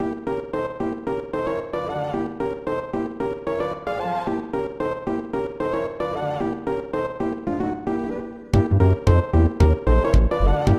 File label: ghost battle; Undertale